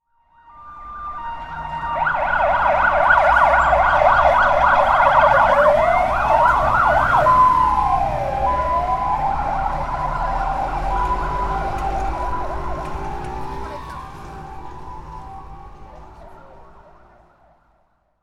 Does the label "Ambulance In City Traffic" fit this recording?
Yes